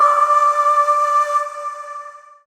Void Shawzin Oct Three D; Warframe; Zariman

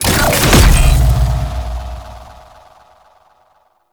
Defend Artifact Insert; Warframe; Missions; Sentient Defend

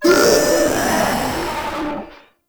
Proto Infested Runner Death; Warframe

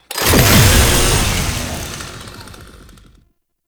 Chain Saw Tonfa Stack Buff; Warframe; Grineer

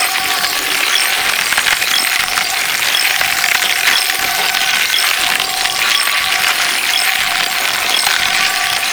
Infested Lich Parasitic Link Loop; Warframe; Abilities; Zeke

